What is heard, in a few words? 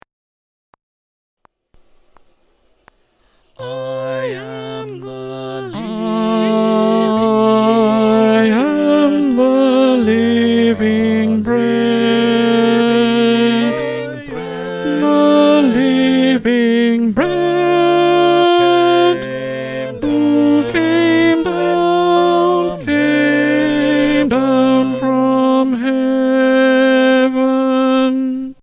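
Alto click track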